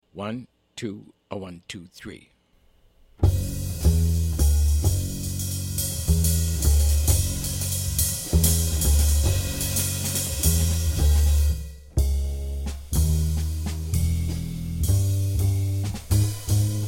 minus piano

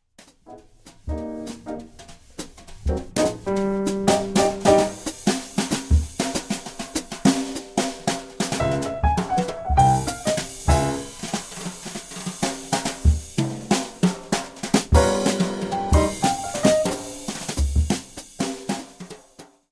minus Bass

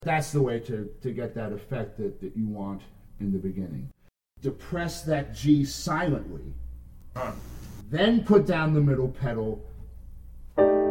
pedal notes

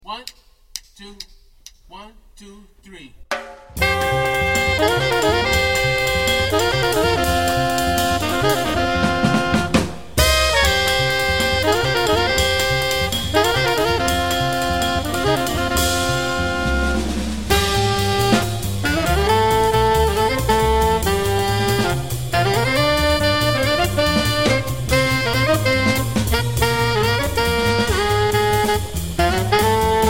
minus piano

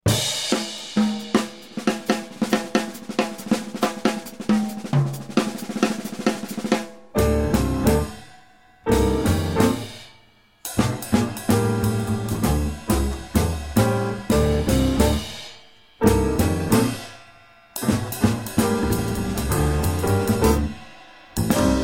4-4 fast minus melody

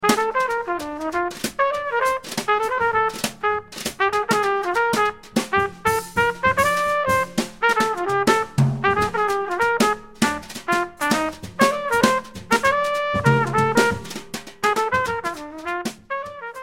minus bass

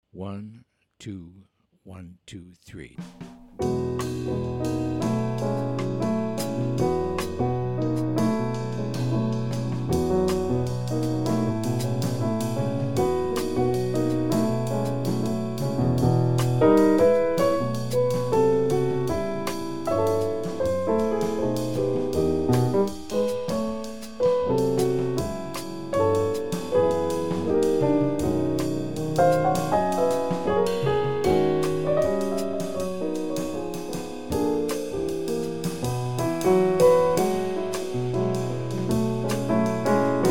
minus Bass

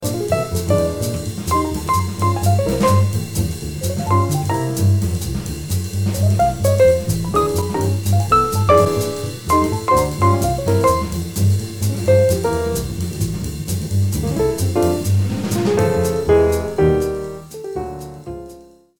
trio

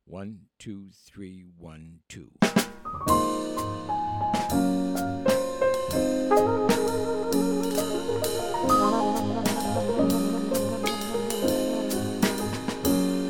minus bass

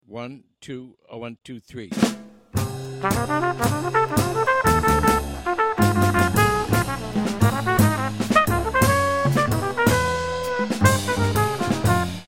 MEDIUM minus piano

